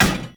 Hammer Impact Metal; Weapon Impacts; Player; Environment; Tuvalkane Hammer

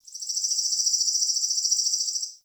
Forest Open Glade Bird Med, Day; Ambience

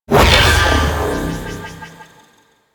Search The Dead Success; Warframe; Necro

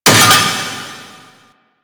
Vaults Stake Gate; Warframe; Entrati

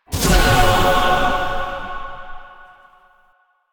Choir Buff Stinger; Warframe

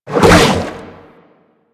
Aqua Board Jump; Warframe; Yareli

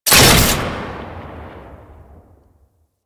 Shot, Weapons; Warframe; Fulmin Prime

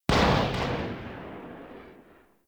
Quill Dart Gun Tail, Weapons; Warframe

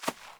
Fawn Footstep, Foley; Animals; Ambient

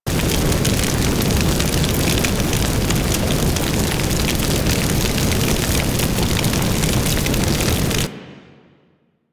Ground Fire Loop; Warframe; Grineer Hammer Boss

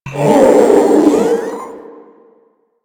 Strike Scream; Warframe; Proto Infested Ancient; Abilities

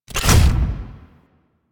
Mech Shield Bash; Warframe; Vehicles; NechroMech; Abilities

